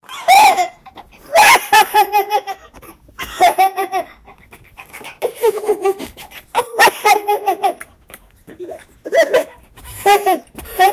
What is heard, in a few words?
a 18 year old young, backyard